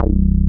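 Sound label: bass; ZERO-G; Datafile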